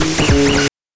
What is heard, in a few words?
BANK2 SFX, NES DPCM; MIDINES